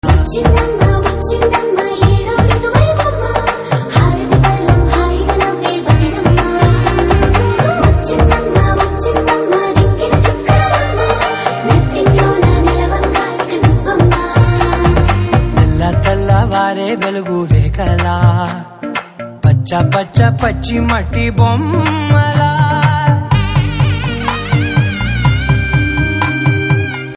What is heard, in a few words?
Theme Telugu Ringtone